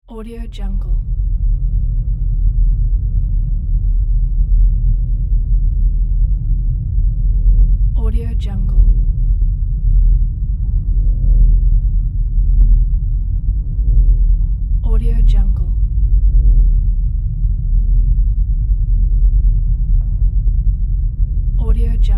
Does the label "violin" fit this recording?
No